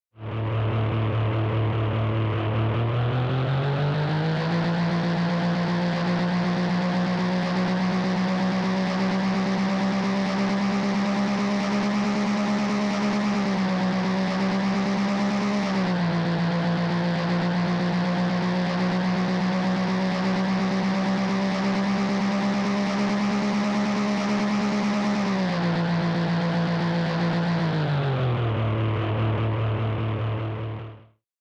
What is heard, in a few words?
SNES Racing Engine